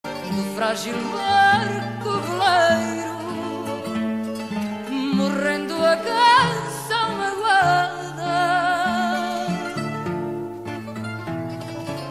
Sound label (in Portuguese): Fado Portugues